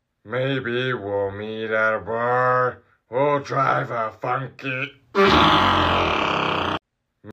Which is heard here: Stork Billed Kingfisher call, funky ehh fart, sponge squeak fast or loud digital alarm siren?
funky ehh fart